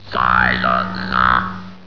silence

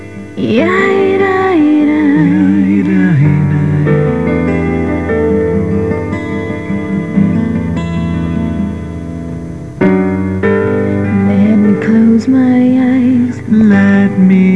duet

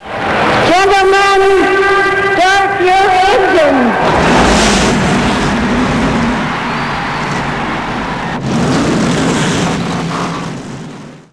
start engines

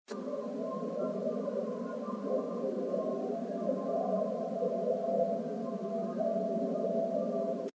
owl

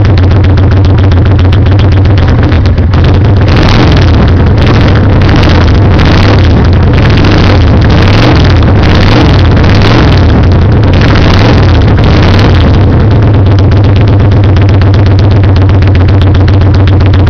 vulcan 800

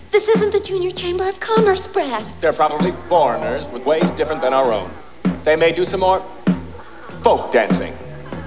folk dnce

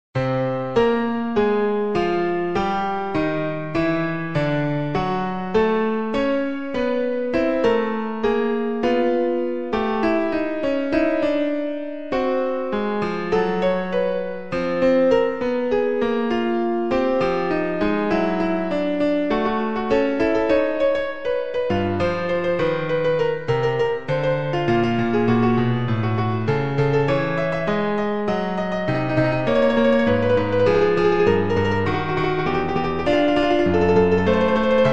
serial piano

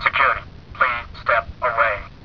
alarm, buick

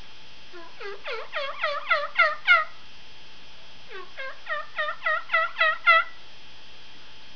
yelp, Turkey calling

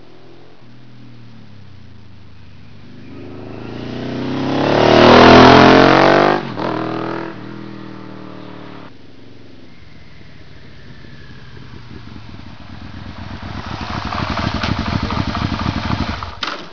Vmax

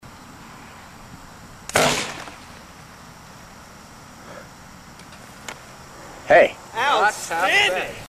boomstick test; blast

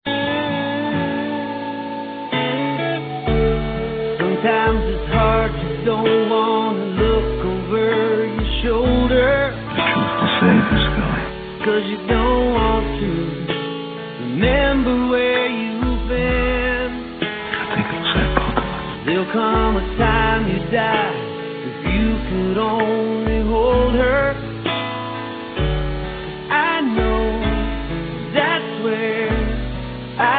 beat of a heart

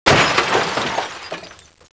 window, automoveis batidas

